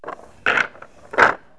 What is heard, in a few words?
rack, 9ball